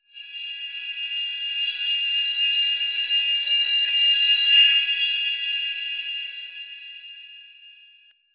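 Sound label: futuristic sci fi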